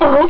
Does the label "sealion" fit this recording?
Yes